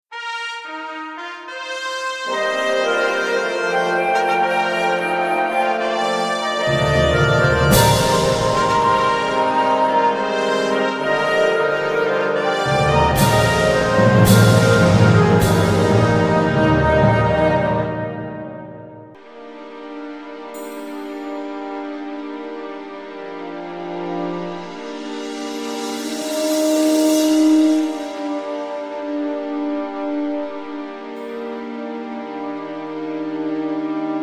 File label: overture with horn solo; Berryhill